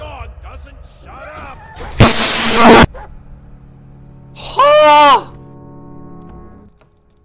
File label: got jumpscared